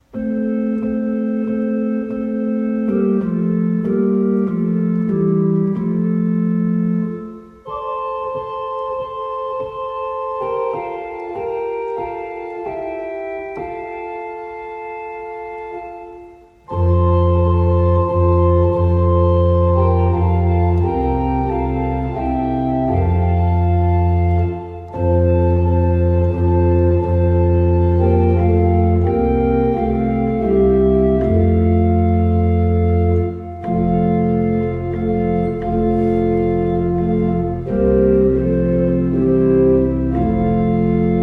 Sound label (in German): mit Vorspiel